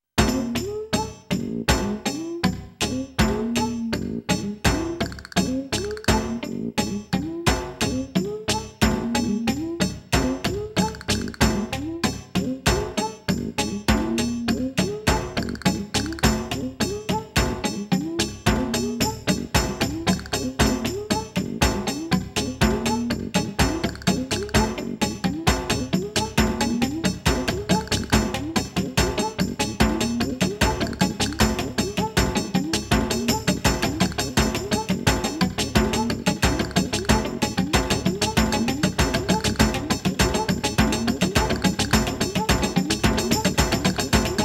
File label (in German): Accelerando Ritardando Perkussionsversion